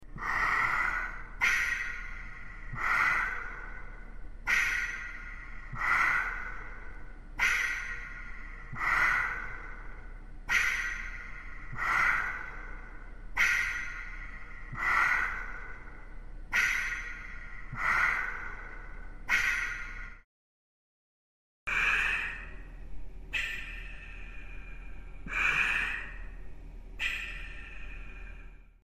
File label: Respirator Pump